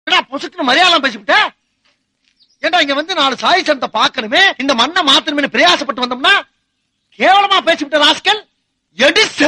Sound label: srilankan ringtone; vadivelu arjun